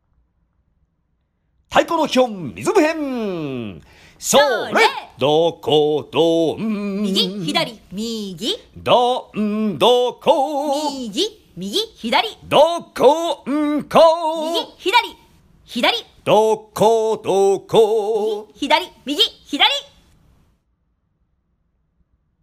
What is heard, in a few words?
song taiko